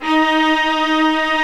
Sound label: String Vlas Sect Agitato; Vas1 Agitato